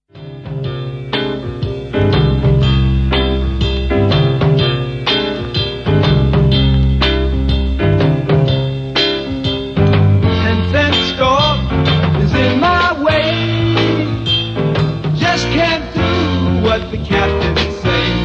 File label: Stone Piano